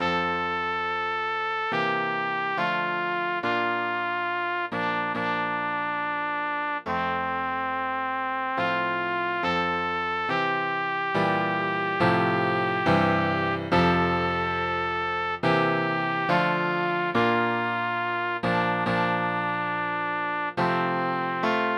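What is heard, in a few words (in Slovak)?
pre trúbku a klavír; Blíž k Tebe, Bože môj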